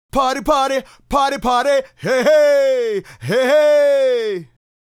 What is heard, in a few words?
Male Solo, Hip-Hop and RnB Vocals